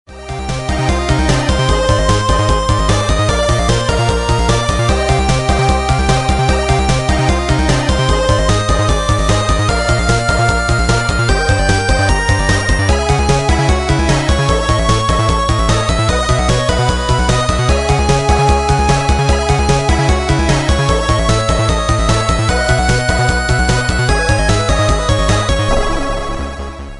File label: Old Video Game Ring